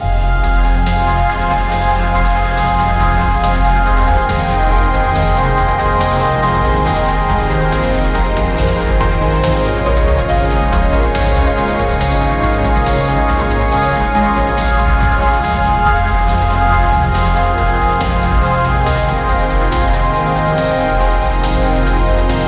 majestic music, edm

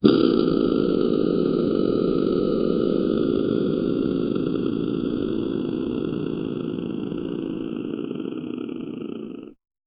create me a small rocket, blastoff

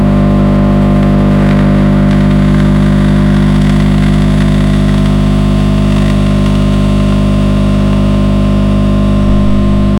machine

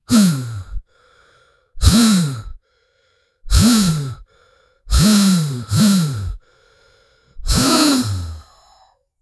single ninja powering up

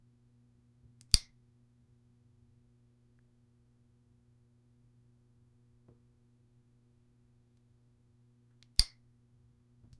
slight flicker in light

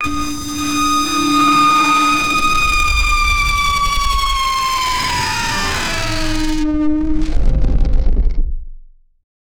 brutal failure sound

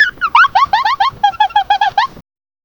spring funny sound

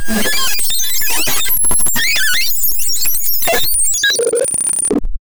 sexy female monster roar

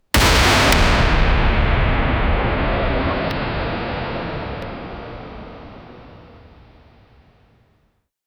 sword

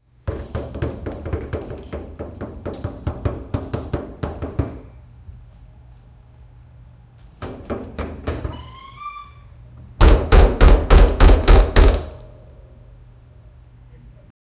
the sound of someone fran, banging

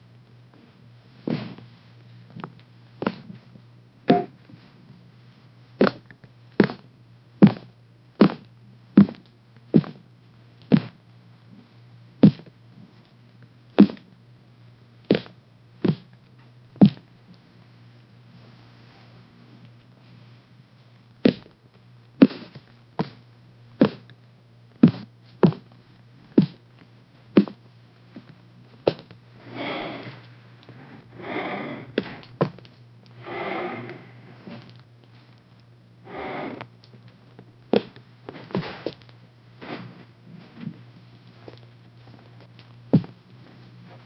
loud footsteps; sfx thumps

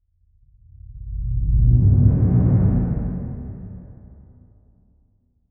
a slow heavy dark woosh

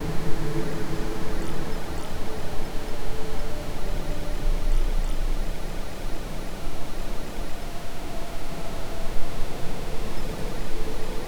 ambience sound of a big; breath

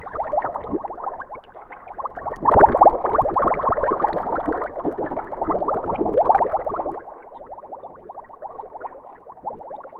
the chemical bath bubbles

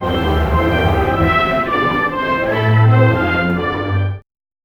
fade in and out, orchestra

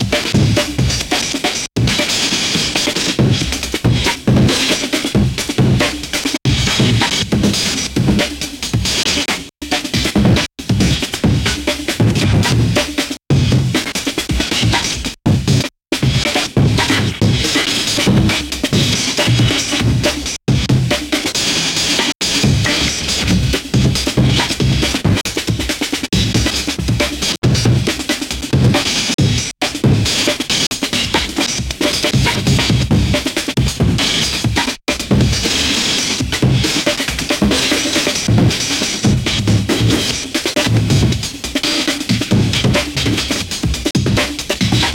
amen break for breakcore

